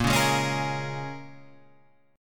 A sharp Minor 7th, A sharp, down, Guitar, Open G; A#m7; strummed

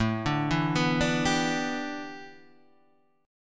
A 9th Suspended 4th Guitar Standard, chord; A9sus4; arpeggiated; JGuitar

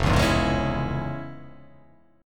F Minor Major 7th Sharp 5th, chord

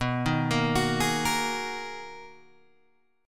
B Major 13th B Guitar Open G

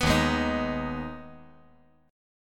D sharp Suspended 4th Sharp 5th

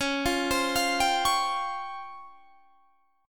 Db Major 7th Flat 5th, Guitar Standard; 21,20,22,22,20,21; DbM7b5 arpeggiated; JGuitar

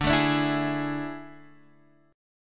A Major 7th Suspended 4th D

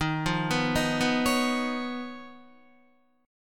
Eb 7th Suspended 2nd Sharp 5th Eb, Guitar; Eb7sus2#5 arpeggiated; JGuitar

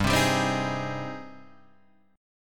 Ab Major 7th, chord

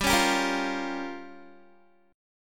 G Minor 7th Flat 5th, Guitar; strummed; 4E,4A,5D,5G,5C,6F; Gm7b5; 15,16,17,15,17,x

